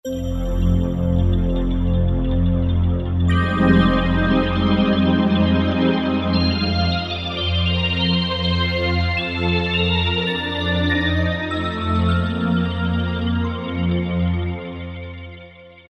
Yamaha TG500; ME Sprk2

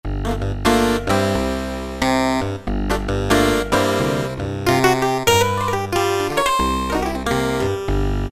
Combie Clav, Roland PN-D50-04

